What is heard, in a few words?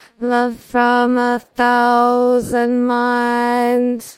singing